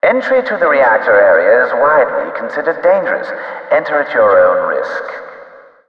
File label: belt ambient; Allegiance